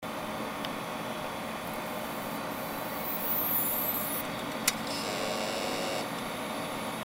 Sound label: startup